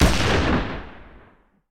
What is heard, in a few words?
ruger black powder revolver single shot; sound-ideas